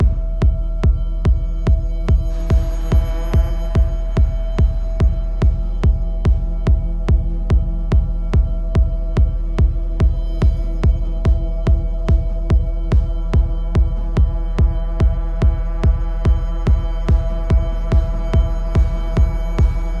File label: Music Neutral; Sifu; H2 New Level Area=Dancefloor Init; H2 Club